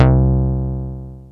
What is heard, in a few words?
R8 ELECTRONIK; Rubber Chicken Software Sample Bank; DRUMS + PERC